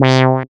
SYN MC WAH, Roland MC-202

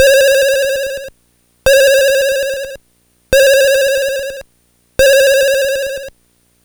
Game Boy FX Sonar, chiptune; Nintendo Game Boy

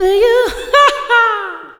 U LAUGH, ADLIB WOM, Funky Vocals; USB Soundscan vol.18

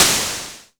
Perc Noise Long, Tama TS-206

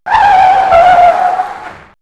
Skid; X-Static Goldmine

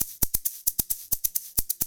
132 Berim. Samba; Spectrasonics - Supreme Beats - World Dance

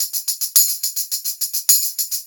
Brass Tamb, Perc Loops; Drum Loops; 4-4; Sonic Foundry; Drum Tools

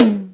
LVHITOM2, MPC-60 DRUM2; Greytsounds VOL-1

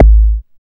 BD ANALOG; Sound & Vision - Gigapack II CD 2

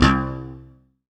PCM SUS C2; Greytsounds - Scott Peers Sound Engineering

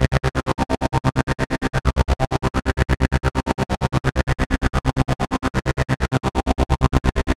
130 C synth, Synth Loops, Trance; Trance Explosion Vol1

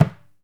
deff mute; Deff Bendir &Req

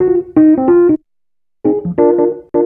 ACID JAZZ; Best Service; Advanced Media Trax Modern Composer

